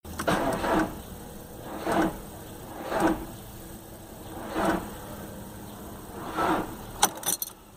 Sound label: dead battery sound